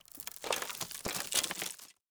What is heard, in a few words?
small debris